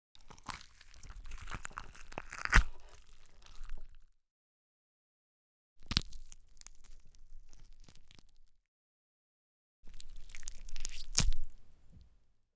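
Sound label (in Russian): есть мясо хищник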